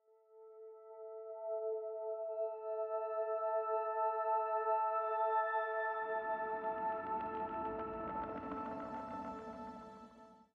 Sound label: 85bpm; Imperium High; Legends of Pegasus; windows gamerip 2012